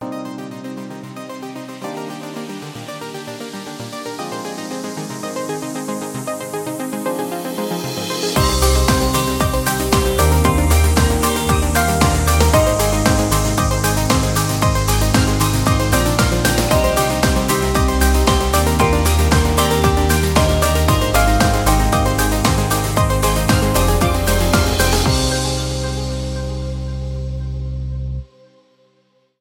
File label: Inspiring Spring Pop, ringtone; MIUI12